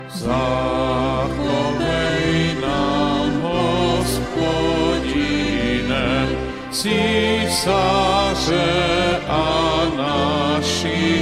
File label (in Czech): hymna cesky